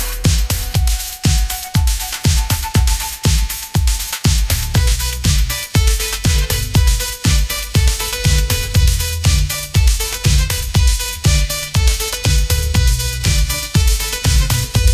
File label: pop groove; ai